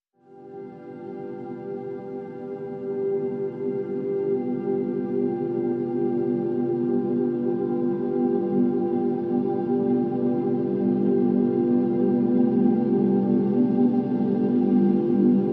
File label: Pads Distant Lights (G)